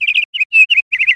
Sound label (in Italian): Canarino